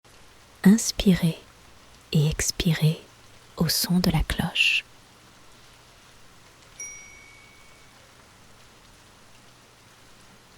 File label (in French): Coherence Cardiaque Son Relaxant de la Pluie Cloche